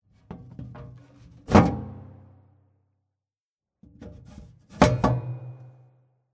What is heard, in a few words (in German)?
Book Boxen Abheben parallel aufsetzen